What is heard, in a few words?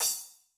Splash, Cymbal; Yamaha DTXpress-II